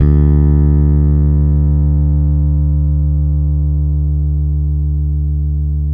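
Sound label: 6-string fretless bass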